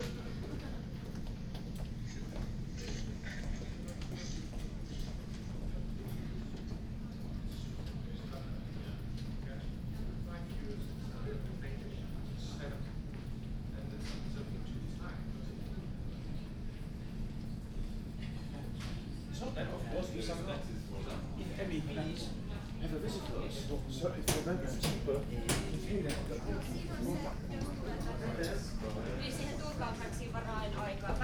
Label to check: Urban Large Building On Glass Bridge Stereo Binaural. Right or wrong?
Right